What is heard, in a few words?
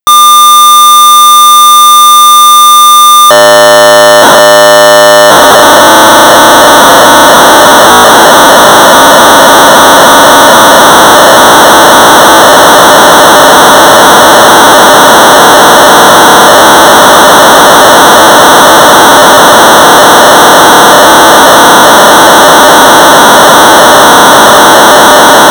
Sorcerer 1200 baud; Lazer Fire; Mark Yvanovich; University Software Vol IV; BASIC